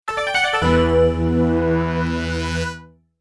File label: stage clear; bust a move again